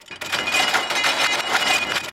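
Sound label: Chain Rolling Around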